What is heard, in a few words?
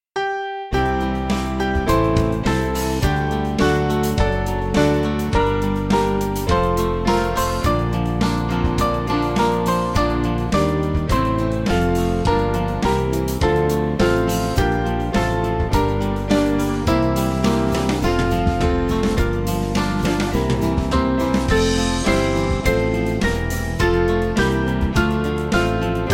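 Music Backing Files for Churches; Though We O Lord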